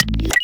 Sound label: adrenaline tank filled 3d